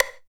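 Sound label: stick; East West - Phat & Phunky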